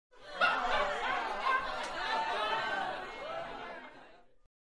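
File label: Crowd 50 Light Burst Interior; small int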